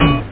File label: kling